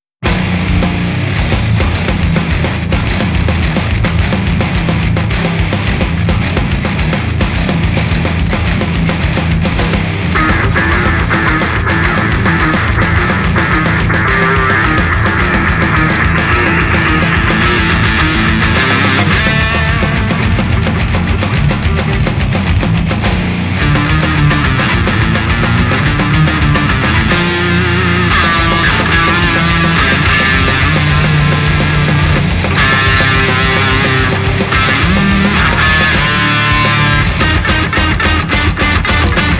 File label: fast hard